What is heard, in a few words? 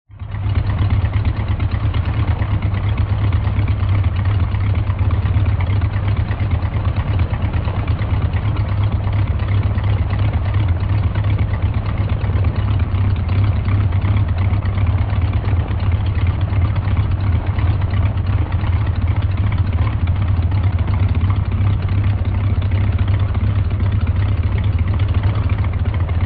AIRCRAFT PROP ANTIQUE SINGLE ENGINE: EXT: Idling with low engine rumble.; ww2 fighters